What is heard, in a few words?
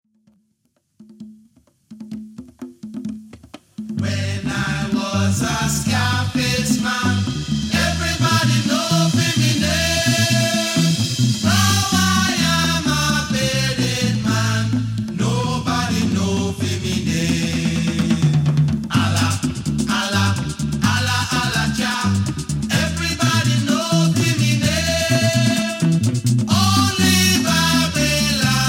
Rastafarian Folk Song